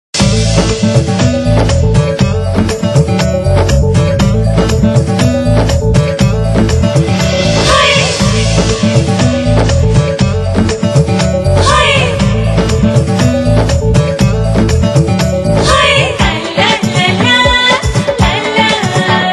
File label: Background Tune; Tv Serials; Nurpur; Star One; Miley Jab Hum Tum